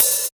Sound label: piercing open hat one shot c sharp key, Royality free open hi hat one shot tuned to the C# note